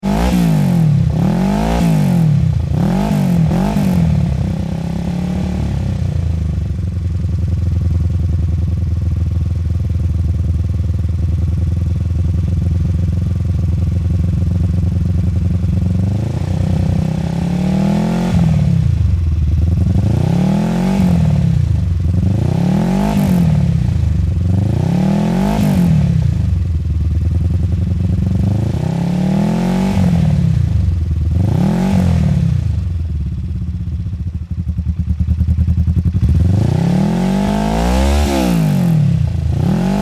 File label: Motorbike Ducati 350 Stationary Revs and Running; Various revs and engine speeds; Motorcycle; Motorcycle Sound Effects